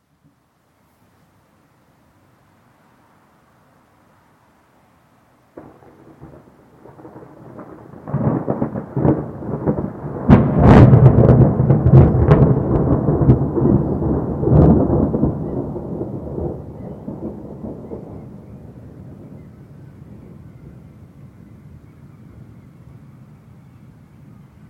clap of thunder in the night waking seabirds

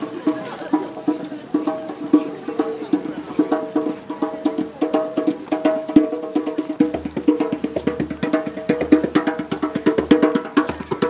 djembe player in park; bournemouth

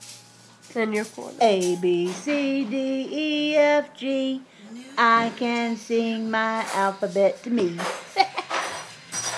momma singing half of the abcs

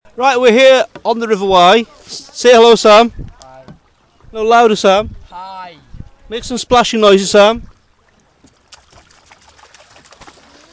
paddling

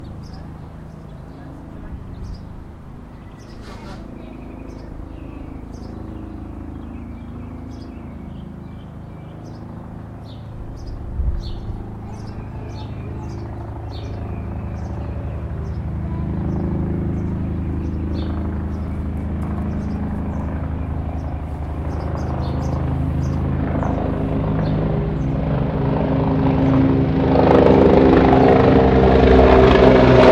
helicopter fly past

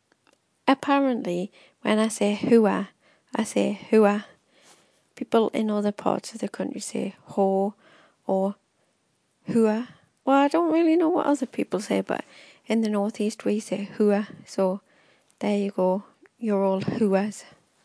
whoooo

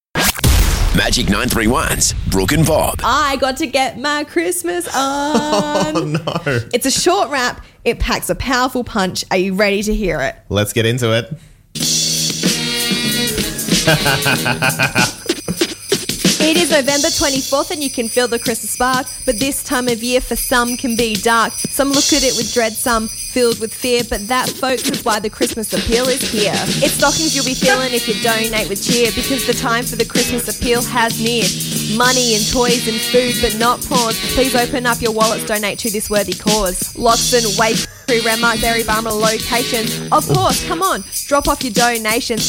Christmas appeal rap

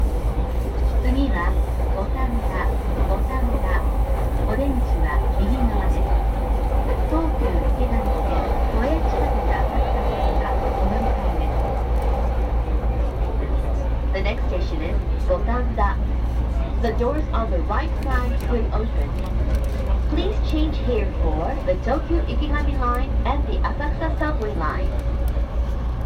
soundscape

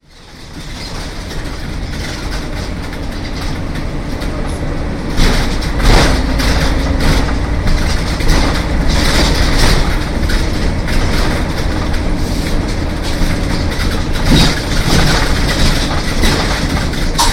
bus; maryhill; uksm

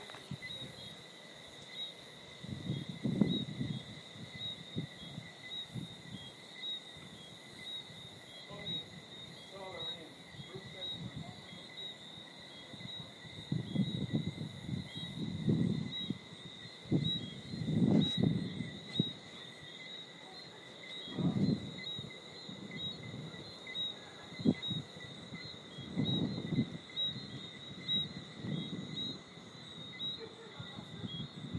night sounds

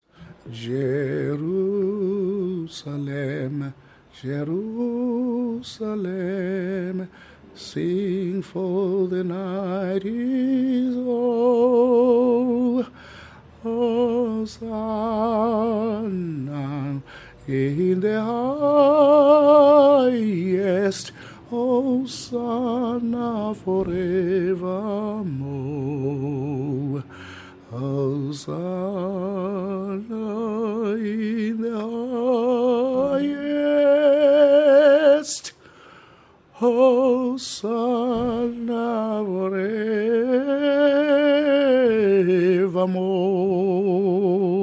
male choir sings; conductor of cenestra

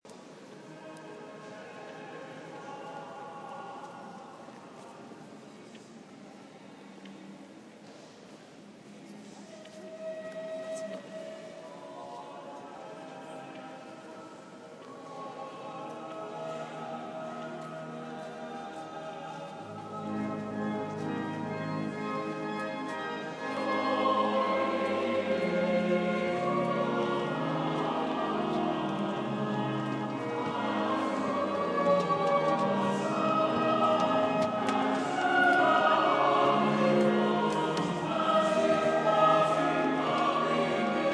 Salisbury Cathedral choir

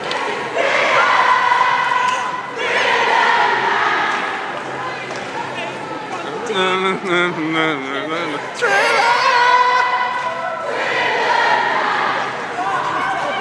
silent disco

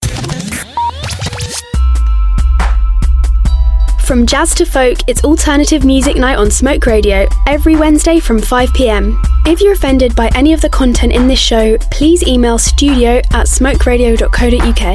alternative jingle; smoke radio

